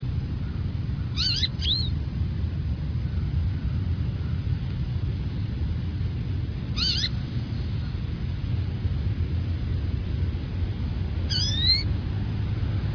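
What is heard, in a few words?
goldfinch; birds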